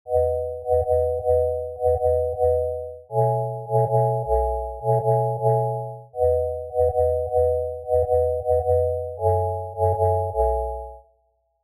Chords

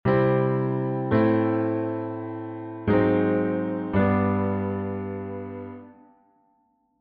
tritone sub after

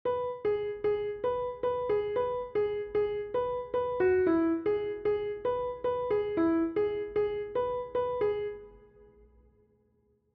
Verse Melody

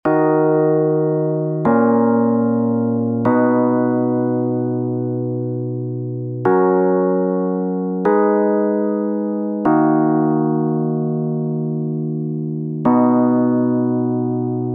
verse pre

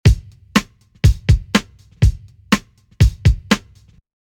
Break Cleaned

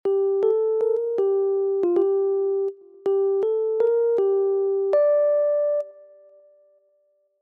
melody

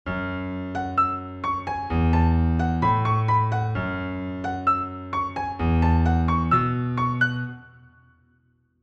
Unison

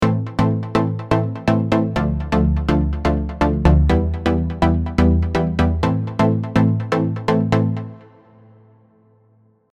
without 7th

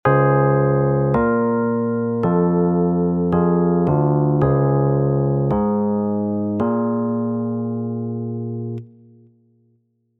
chords no rhythm

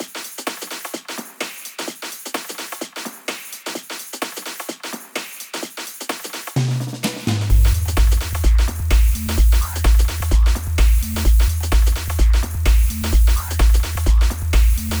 Fill

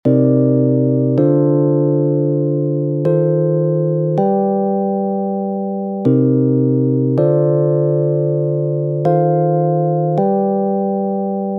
Chords

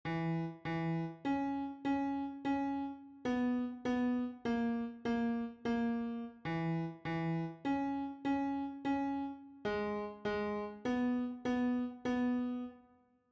Bass Guitar

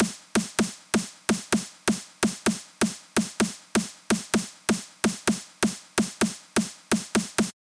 Roll

